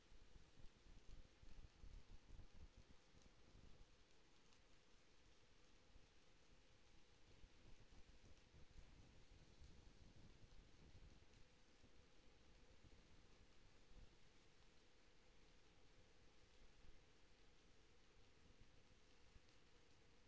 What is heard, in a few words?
HYDROPHONE 16000Hz; JASCO AMAR HYDROPHONE 740